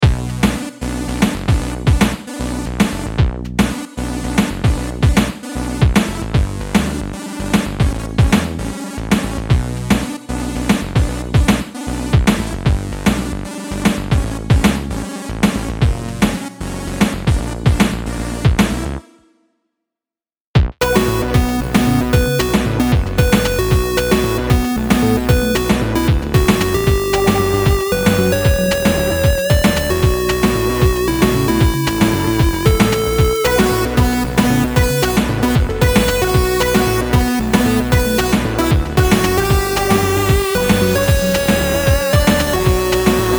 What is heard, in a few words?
swinging melody; edison18